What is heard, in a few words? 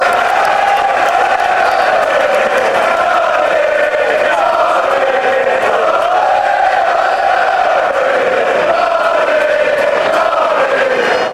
ole ole ole, fanchants